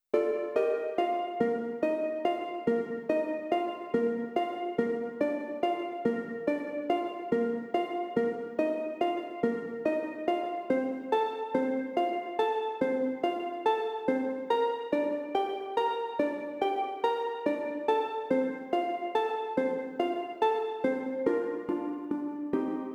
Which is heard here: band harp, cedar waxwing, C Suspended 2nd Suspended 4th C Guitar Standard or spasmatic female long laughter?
band harp